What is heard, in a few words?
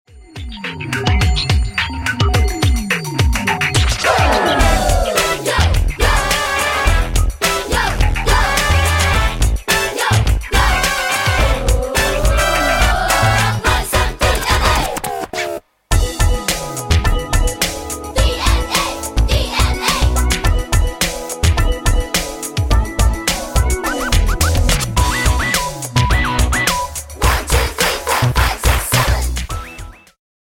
Karaoke